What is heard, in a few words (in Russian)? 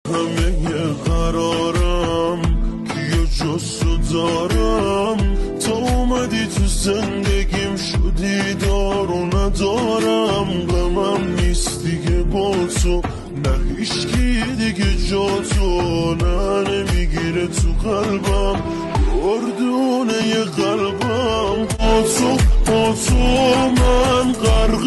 красивая песня